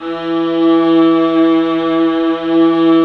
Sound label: viola, 3-D pads; 3D Multis